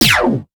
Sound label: lazer; Soldiers Combat